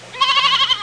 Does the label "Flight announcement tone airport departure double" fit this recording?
No